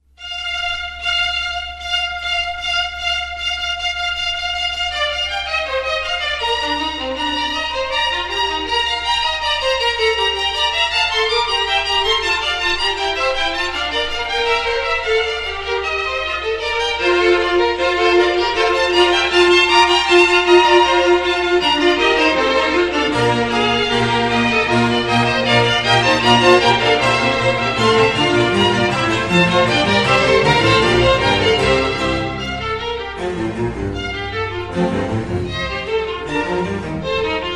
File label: fugue; Baroque; Fugue 1